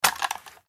pickup, rifle

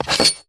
crowbar pickup; Items handling

